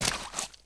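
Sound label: player water splash; firearms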